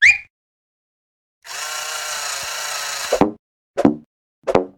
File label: fill with lead; toontown-ceiling